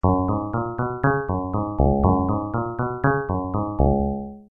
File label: sequencer; nyquist